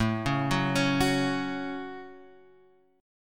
A Major Double Sharp 5th; Ax5 Chord {5 4 4 6 7 X} arpeggiated

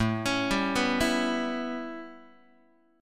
A 9th, down, Guitar; arpeggiated; JGuitar

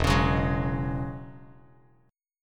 E 6th Add 9th E down Bass Standard; E6add9 strummed; JGuitar

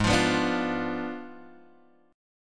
Ab Diminished 7th Ab Guitar Drop D; strummed; 6,8,9,7,6,x

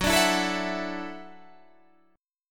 E 7th Suspended 2nd Suspended 4th, F sharp, chord

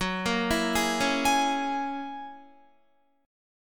Gb 9th Gb down Guitar 4E,4A,5D,5G,5C,6F; Gb9 arpeggiated

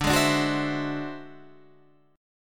D 9th Flat 5th D, guitar

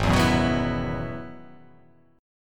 Ab Minor 7th Sharp 5th, chord; strummed; 4,7,4,4,6,6,6,x

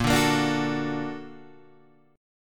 Bb Augmented Major 7th Bb, Guitar Open G; 8,7,8,7,7,7

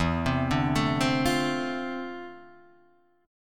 D-Minor 7th, E; Dm7/E; arpeggiated

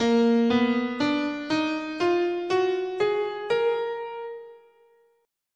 Bb Phrygian Dominant

